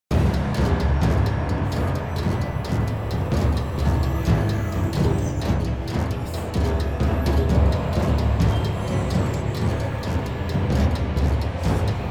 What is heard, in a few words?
Kraken Loop